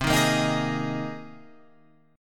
C Minor 7th Sharp 5th C Guitar Open F; Cm7#5 strummed; JGuitar

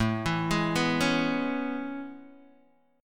A Major 9th A, Guitar, DADGAD

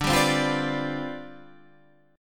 C Major 7th Suspended 2nd Suspended 4th D, chord

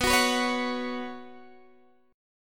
B Suspended 2nd B, chord; strummed

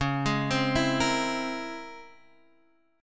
C sharp Minor Major 13th Guitar Half Step Down

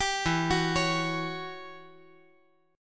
E Minor 6th Add 9th chord

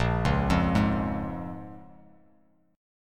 A sharp Minor 7th, Bass Standard; arpeggiated; 6,4,3,1

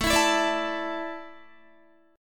A Suspended 4th down Guitar; Asus4; strummed; 4F,4A,5C,5G,5C,6E

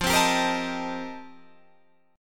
F 11th F, down, Guitar Drop D

